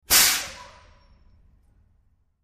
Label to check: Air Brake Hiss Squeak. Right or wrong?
Right